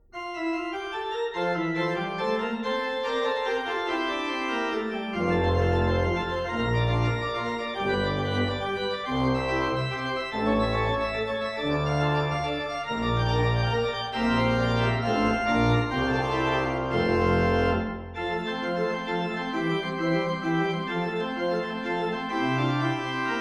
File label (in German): aus den 8 kleinen praeludien und fugen bach f dur